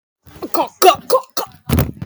guh guh

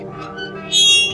metal door creak

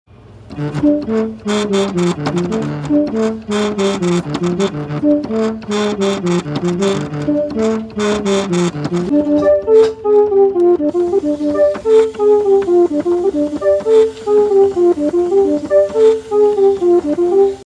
played on piano

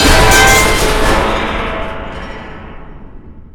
crusher impact; jolly 3 chapter 1

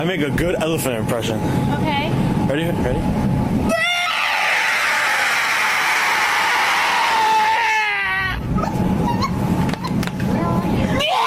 best elephant impression

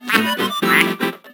duck lootbox sfx; discord

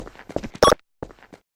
boopp; half-life

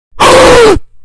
gasping

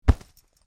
pushing someone

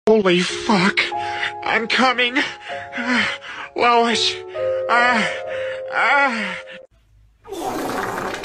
ahhh

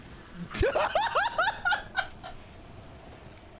laugh

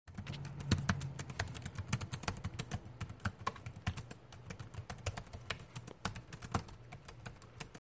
keyboard typing

